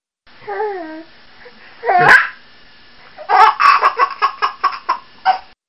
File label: laugh; Laughing Again